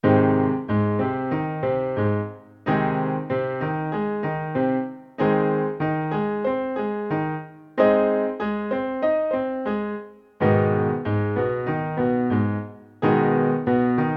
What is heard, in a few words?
triad and inversion rhythm in A flat and A flat m